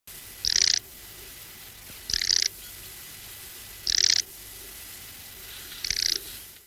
grol, trekroepjes; tijdens de vogeltrek

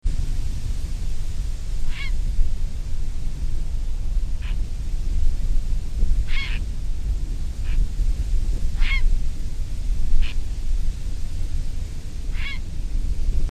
Blauwe reiger, trekroepjes

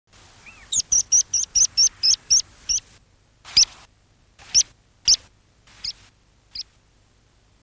graspiep; tijdens de vogeltrek

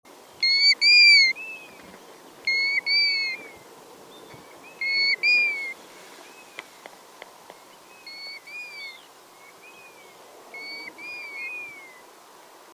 Goudplevier, trekroepjes; tijdens de vogeltrek

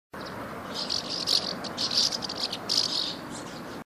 Huiszwaluw, trekroepjes

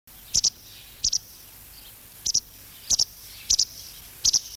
witte kwik, trekroepjes

